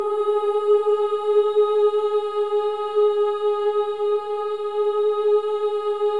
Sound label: Boys; Spectrasonics; Symphony of Voices